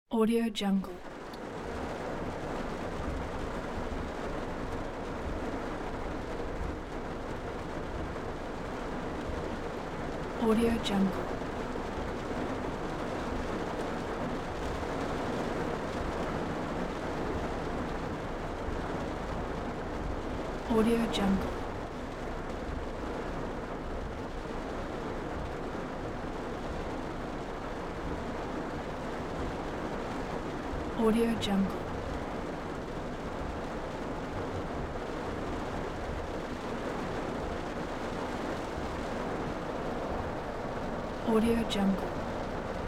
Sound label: car drive while raining; urban sounds